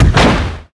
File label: damage hits explosions; World Of Warships